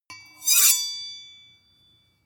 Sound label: sword blade grind swish